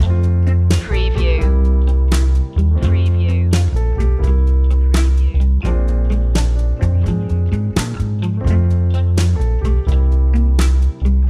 nice and easy whistle loop

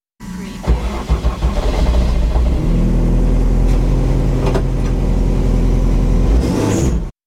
Rally car starting; beanstalk audio

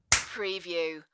Face Slap

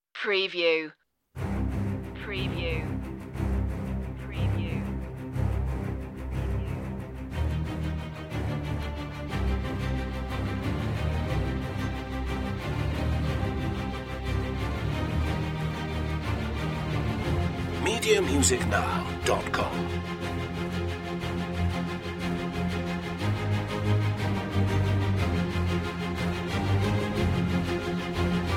strings stem

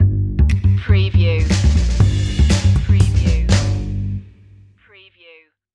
funky baddy stinger

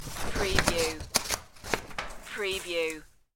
Quick Paper Cut

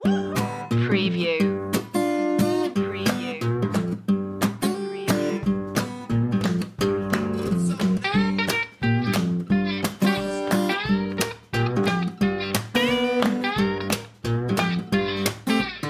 deadwood shuffle

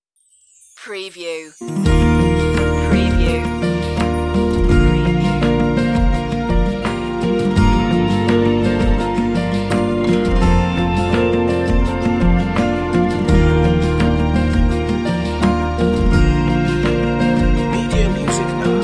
acoustic escape

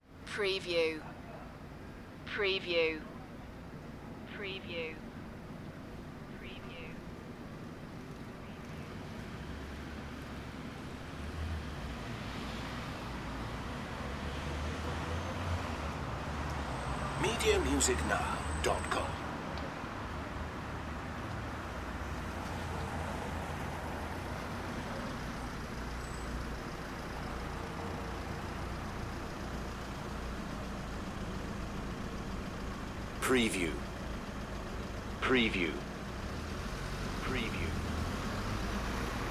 city traffic ambience

